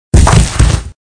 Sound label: Rock Crush; Angry Duck Cannon 1.5